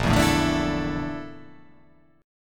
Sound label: A sharp Major 7th Flat 5th A sharp